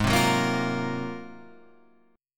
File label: Ab 13th Ab Guitar Half Step Down